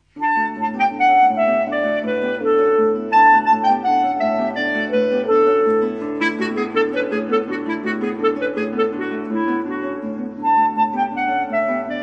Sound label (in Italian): CLARINETTO E CHITARRA